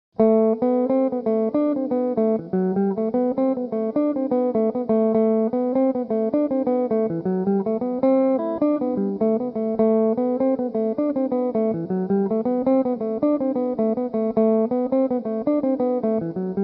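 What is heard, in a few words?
jig a dorian, tenor mandolin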